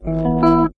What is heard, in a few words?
power plug